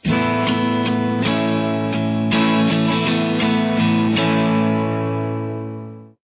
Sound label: A add9